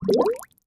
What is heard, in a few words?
Liquid Water Bubble Surfacing And Popping Multiple